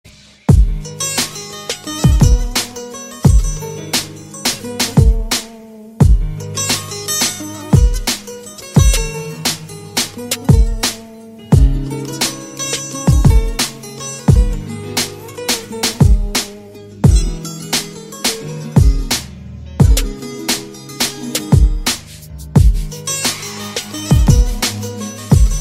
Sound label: saz